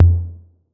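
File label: 808 low tom; Electronic; Wizoo - Platinum 24 Electronic; CHR TOMS 808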